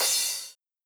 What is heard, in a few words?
soft hit finish